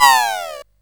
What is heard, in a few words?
Dark Castle, CSG8580R5